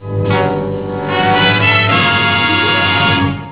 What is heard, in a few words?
drama sting; bradybunch